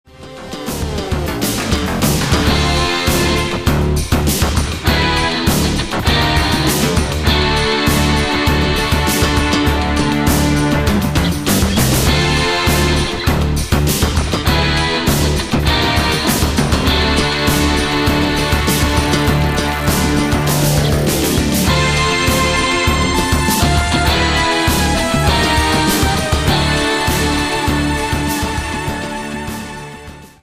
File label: march of living deads